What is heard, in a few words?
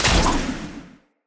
Spider Wire Impact Whoosh